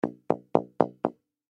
Baritone Mouth, Baritone Mouth Piece Tap; Cartoon; Musical

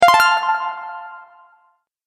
Magic Bright Bonus, video game

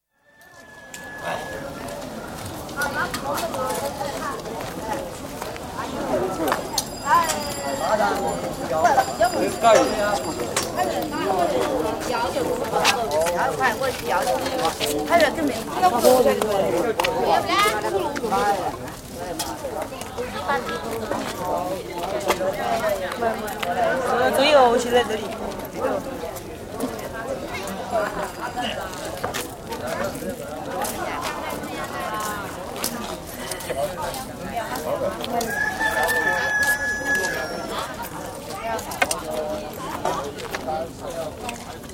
Wuhan: Town market; Stores And Mall; BBC

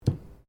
Bottle Glass Pick Up; Various bottles; Glass (Sound Effects:Materials)

